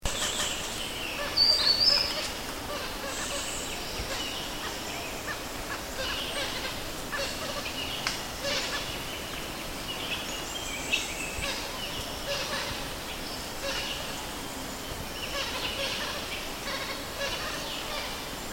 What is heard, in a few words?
Visayan Hornbill Penelopides panini